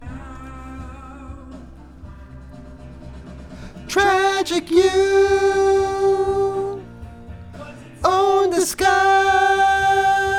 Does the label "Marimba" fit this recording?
No